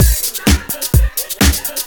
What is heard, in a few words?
Straight Loop 128 BPM, House